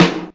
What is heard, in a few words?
low tone snare drum sample f key; snare drum samples